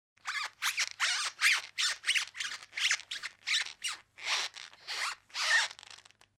skrip reziny

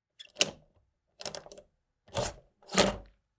zvuk otkryvanija dveri

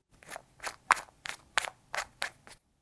zvuk zakruchivanija

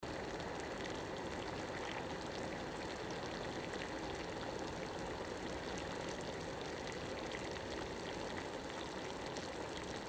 zvuk kipenija

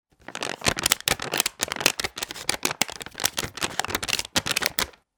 hrust deneg

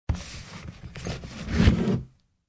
zvuki biblioteki

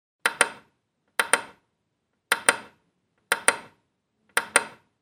zvuk knopki

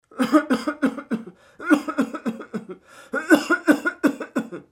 zvuk kashlja

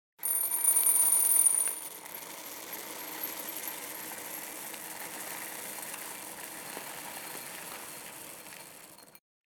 zvuk peresypanija

